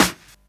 SD, HIP, Dance Mega Drums

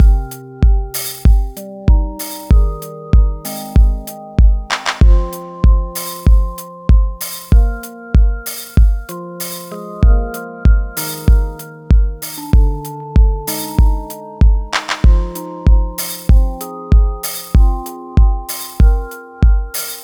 Combination Rhythm; Sonic Foundry (Sony Creative Software); Twine-IDM; Rhythms; Combinations

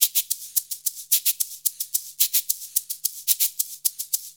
110 MIX, Percussion Loops

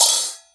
CHINA CYMBAL CL; AKAI S-Series CD-ROM Sound Library VOL-5

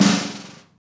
Rock Snare Ghost, Roland BK-5; Snares

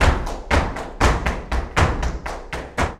BULERIAS TAP, Flamenco

